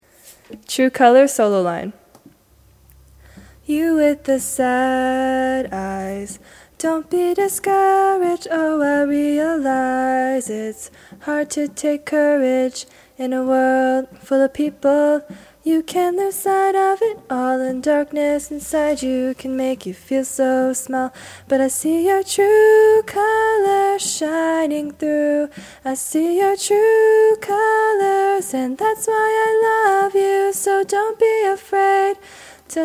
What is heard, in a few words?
solo line